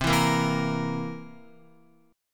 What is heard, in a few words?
C 7th C down Guitar 4F, 4A, 5C, 5G, 5C, 6E; strummed; JGuitar